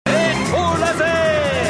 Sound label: retrolaser; Goldorak CPC